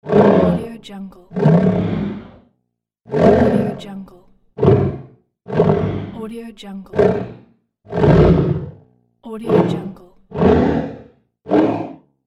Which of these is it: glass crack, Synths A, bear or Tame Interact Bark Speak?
bear